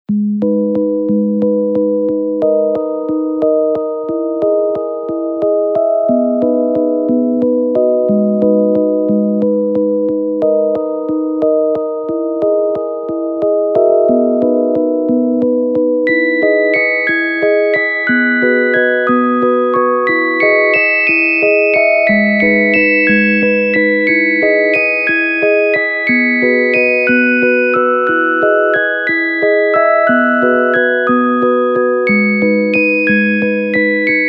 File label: instrumental